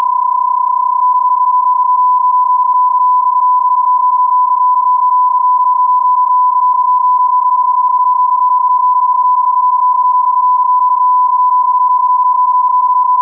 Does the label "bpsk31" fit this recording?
Yes